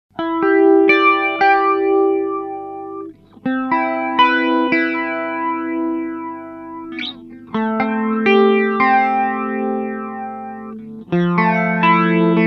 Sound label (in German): EQD Aurelius Mode C width 5 Uhr rate 10 Uhr Balance 3 Uhr